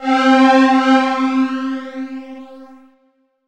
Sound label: Synth Atmos; Best Service ProSamples vol.36 - Chillout